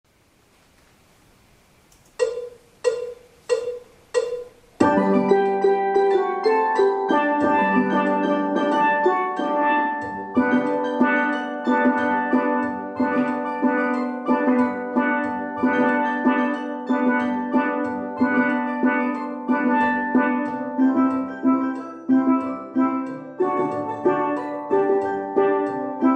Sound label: alto